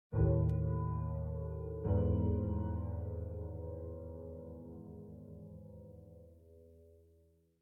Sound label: Late Day; Lethal Company